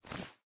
Dragon Whelp Wing Flap

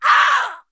Harpy Attack

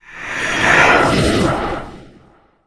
Voidwalker Void Wraith Wound Crit

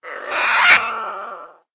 Frost Wyrm Pet

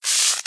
Forge Zap; Doodad

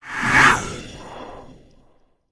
Voidwalker Void Wraith Attack

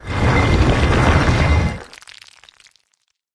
big Door Open; Doodad

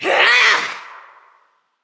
Attack Medium; Sisters; Fjola

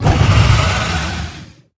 Proto Dragon Attack

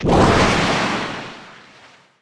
ooze Explosions; RotFace; Spells

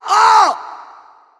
Strawman Wound Crit

